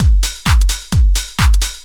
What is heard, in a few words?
drum 130 dance, drumloops